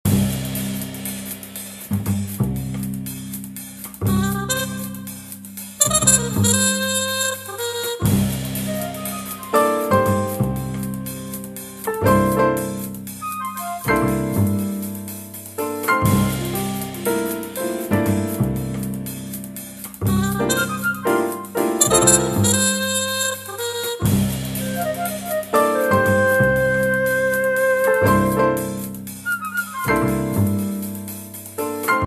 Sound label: iphone ringtone